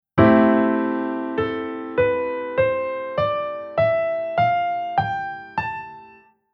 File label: Chord Chord Scale Major